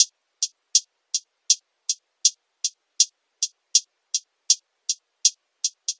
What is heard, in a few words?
Hihat Loop, 80 BPM; Drum Loops; Lofi; Cymatics; Lofi Starter Pack